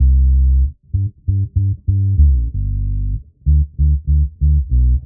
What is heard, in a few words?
P Bass C, 95bpm, dub; musicradar; dub designer